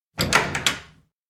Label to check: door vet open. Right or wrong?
Right